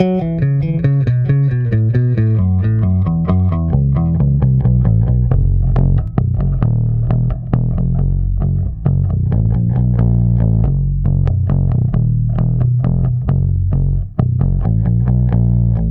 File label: active bass and mids flat treble